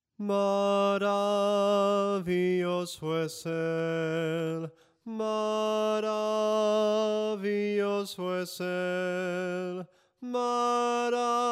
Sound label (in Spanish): SOPRANO CANTADO, himnos en melodia soprano